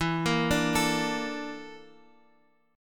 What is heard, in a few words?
Bb Major 7th Flat 5th